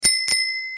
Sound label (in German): Fahrradklingel Neon blue